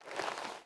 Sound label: Idle leather mid